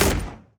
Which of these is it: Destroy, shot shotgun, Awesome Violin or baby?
shot shotgun